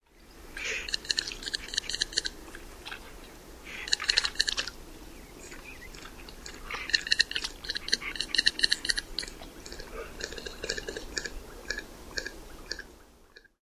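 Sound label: aggressive clicks and hisses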